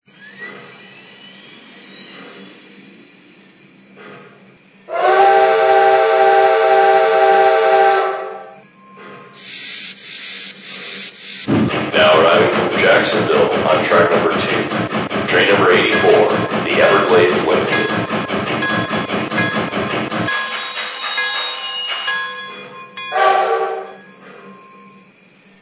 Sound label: Pacific